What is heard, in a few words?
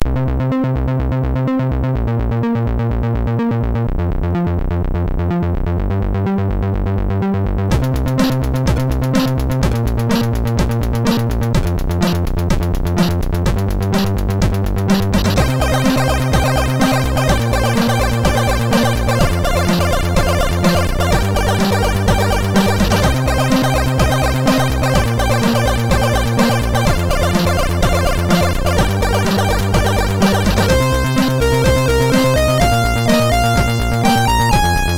Sound, MOS6581R2